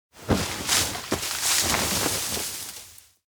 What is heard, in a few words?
climb tree branch; anemoi